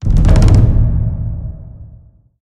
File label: random started; silverback